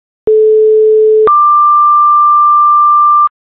fire, tones

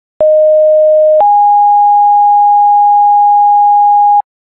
siren; clinton

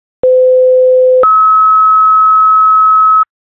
chief, tones

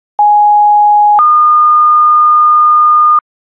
fire, tones; pfd; clearfield

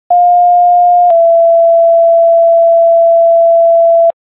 siren, tones; elk

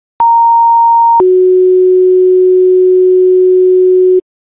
duty, tones

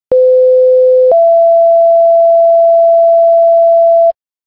siren, tones

